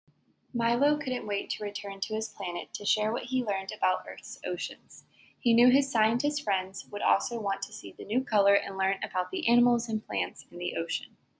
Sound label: laugh; Milos Exploration